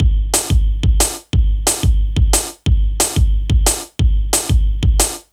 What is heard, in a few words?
Kick Snare Loop